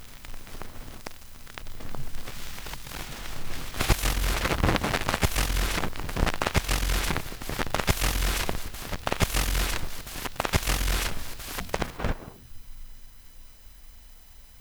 vinyl crackle; 99Sounds; Vinyl Noise SFX

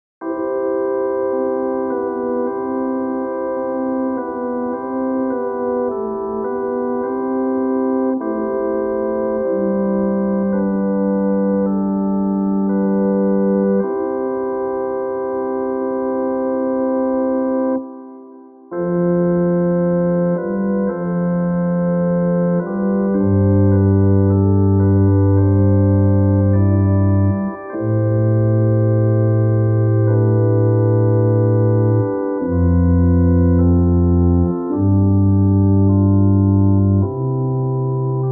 Music for Funeral Home, Ambient

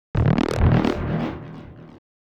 Tech, Weird Side Samples

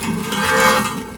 yelling metal; Sound Design Tools; Raw Foley

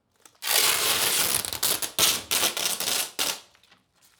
Shipping Tape stereo pulling; GDC 2020 - Game Audio Bundle; Hear and Now Sound - Tape Tape Tape!! The Essential Sound Library for Tape Sounds

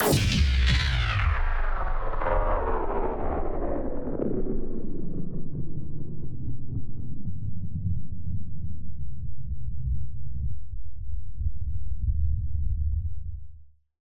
Hit Shot Sci-fi; MatiasMacSD; Dodge This

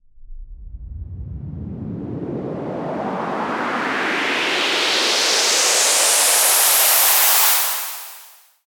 Short Sweep, White Noise

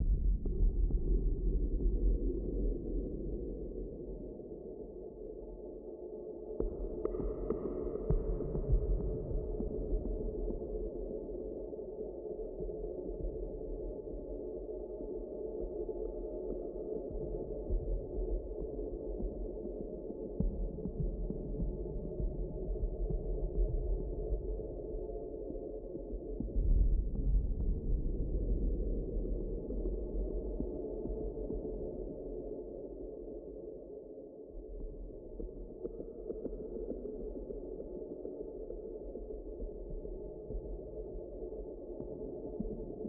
Digital-Horror - AMB - Pulsating breathe; Yakumokobe; Digital Horror Full Pack

